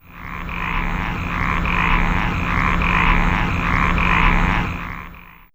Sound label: NIGHT, Fairlight CMI, ANIMALS; Fairlight CMI IIe